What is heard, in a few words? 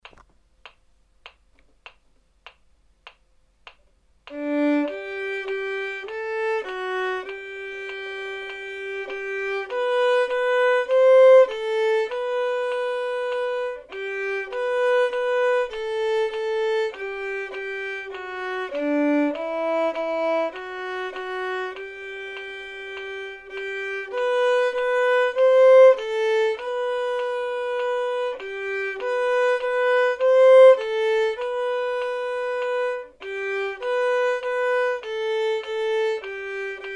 vln prac